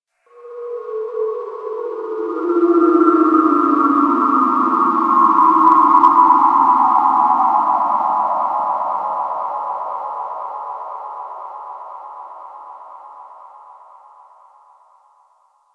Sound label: Sector Wars Rand Amb, Ambience; Warframe; Tenno